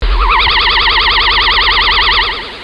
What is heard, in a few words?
futuristic, electronic sounds; Sound Effects for Multimedia - Volume 1